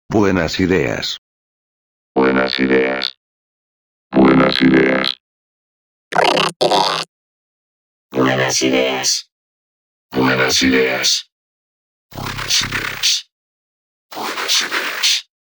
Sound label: VOCODER Vox; CHERRY AUDIO RACKMODE